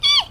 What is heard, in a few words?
eek